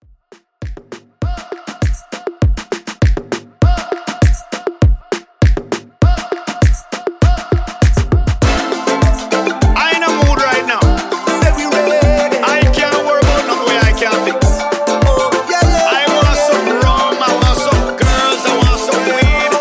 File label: Reggae Moombah 100Bpm